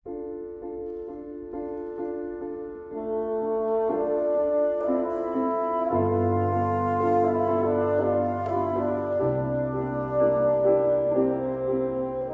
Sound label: Bassoon Piano; Gabriel Faure; Apres un reve